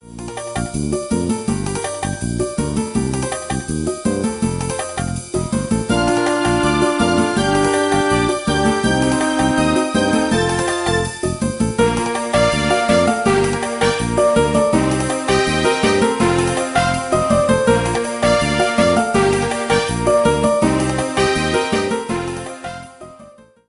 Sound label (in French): sonnerie